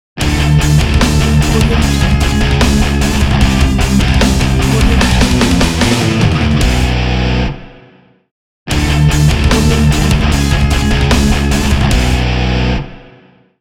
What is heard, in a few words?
heavy metal logo